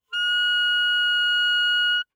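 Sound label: Eb Clarinet fortissimo Gb4; Woodwinds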